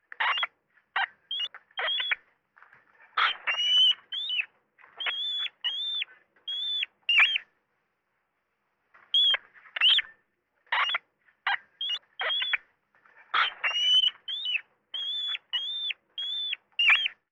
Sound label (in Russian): Звук крысы